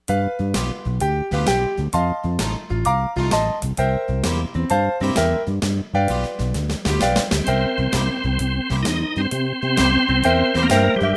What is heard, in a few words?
Blues Set